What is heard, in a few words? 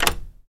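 Dead Bolt Unlock Quiet, lock & keys; Dead Bolt Unlocking Quick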